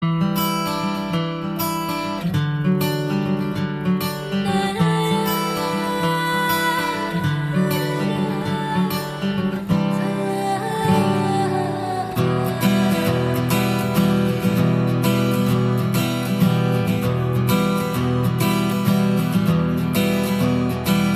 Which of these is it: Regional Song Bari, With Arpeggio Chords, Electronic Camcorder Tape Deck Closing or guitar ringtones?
guitar ringtones